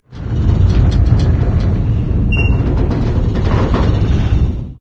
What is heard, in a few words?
bin squeak long; video games; ambient; source engine; portal2; outland